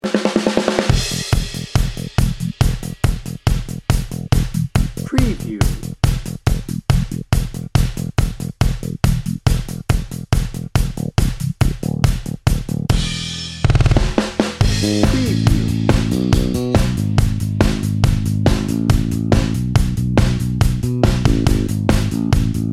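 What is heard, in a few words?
positive outlook drum and bass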